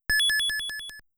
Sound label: PK Beam; mother1